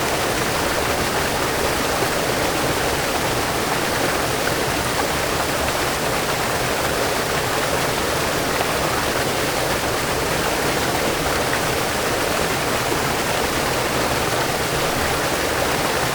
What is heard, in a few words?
River, Static Microphone